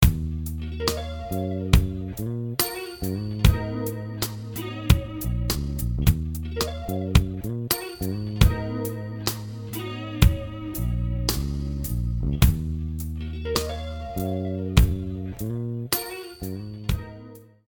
Tempo Change

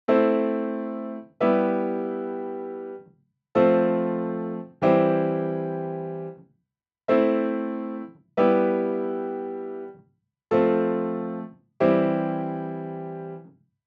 Piano Unison; Apollo; V76

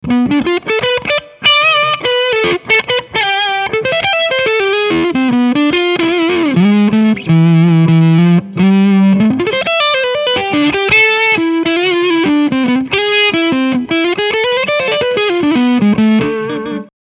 Crunch Lead

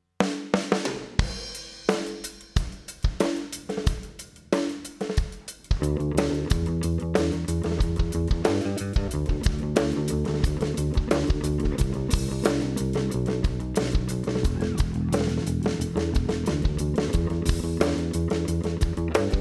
Bass Dry

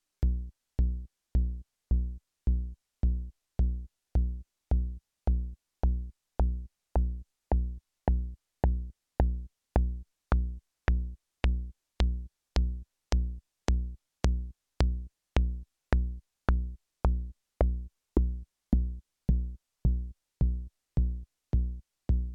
Volca Kick Pulse Test

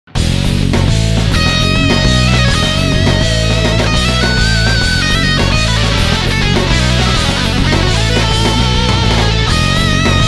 Powermetal

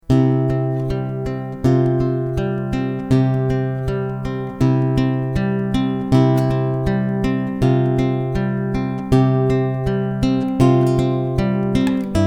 slow picking

